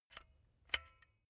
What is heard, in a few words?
silicone gun bullet lock mechanism soft; soundpool